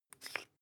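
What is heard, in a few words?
toothpaste open twist hard, Bathroom; haus-garten; badezimmer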